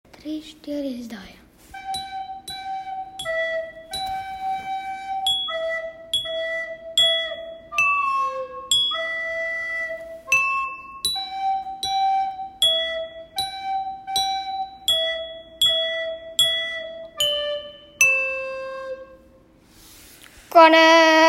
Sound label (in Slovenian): Bele snezinke instrument